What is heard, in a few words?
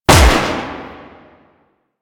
Fire; Warframe; Weapons; Gotva Prime